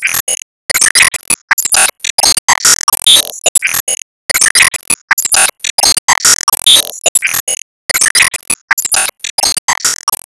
Enemy Factory Active Loop

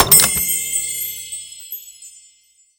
UI Menu Upgrade MagicItem

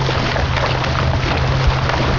Mud; Test Drive Off-Road 3

